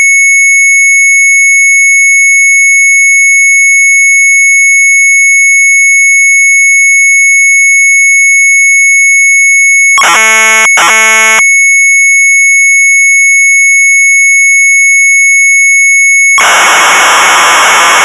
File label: MZ800 Tape, sharp; HAMURABI; retromelsarchive